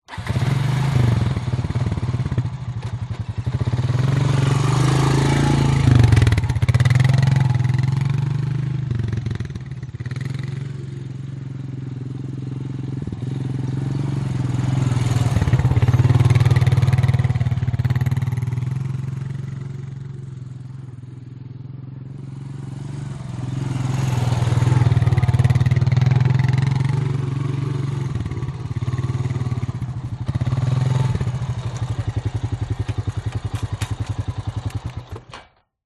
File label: vehicles atv quad bike start drive around close by off; zapsplat